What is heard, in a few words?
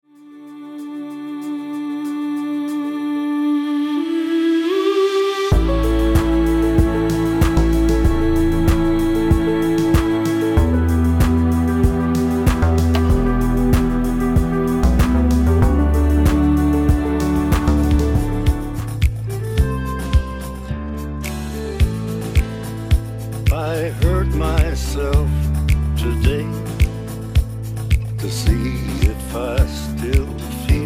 Pool Beach and Chill In Store Radio Background Music